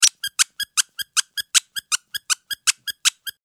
マスコット ピコピコピコピコ

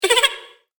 おばけの笑い声

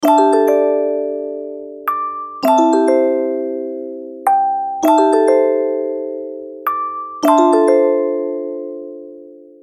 ミステリー オルゴール

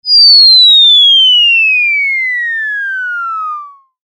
落下

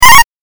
8bit アラート

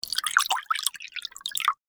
水を注ぐ

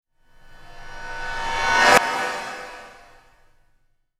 金属音逆再生 単発

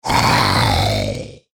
クリーチャーボイス

